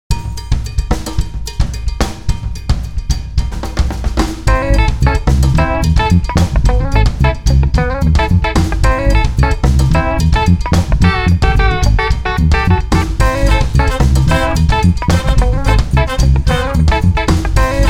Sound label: New Orleans Cowbell Jam